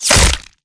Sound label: bullet generic head kill shot human; Titanfall 2